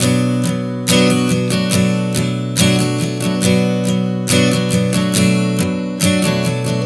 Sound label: Strum 070 Bm, One Chord Strumming 070 bpm; Sonic Foundry (Sony Creative Software); Songwriter Acoustic Guitar Companion